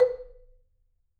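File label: B3, Marimba dead note; Sampleheads - Dave Samuels Marimba & Vibes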